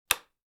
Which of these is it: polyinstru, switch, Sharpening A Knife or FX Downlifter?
switch